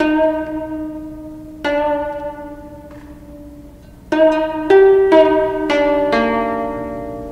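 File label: guitar slow motion; Loops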